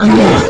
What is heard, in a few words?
pain, player; QUAKE2; cyborg